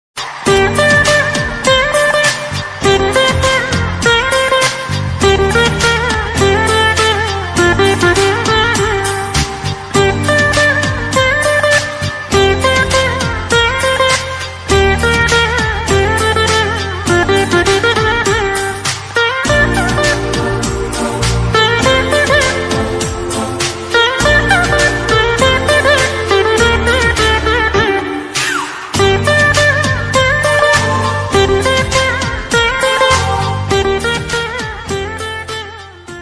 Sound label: love bgm ringtone; kushi